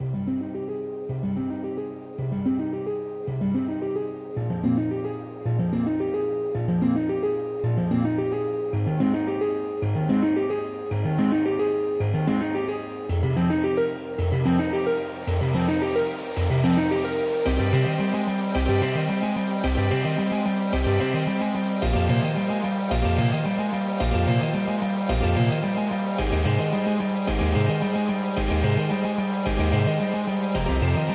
House Synth 110bpm

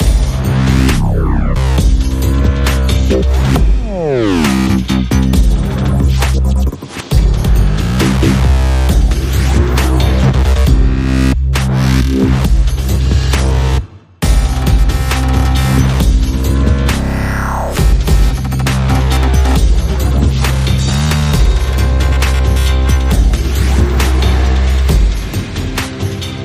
inhale dubstep

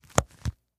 leather wallet close

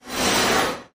hovercraft whoosh

